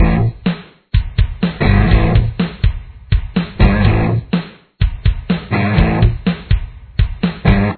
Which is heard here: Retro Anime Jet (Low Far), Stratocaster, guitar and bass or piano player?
guitar and bass